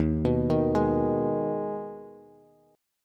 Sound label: Eb Suspended 4th Sharp 5th Eb Bass; Ebsus4#5 arpeggiated; JGuitar